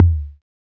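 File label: bass drum, analog, KPR-77; Sound & Vision - Gigapack I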